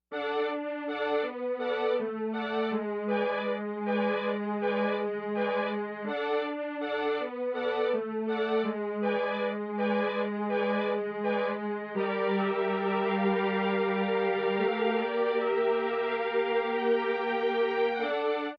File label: Mellotron 16 Strings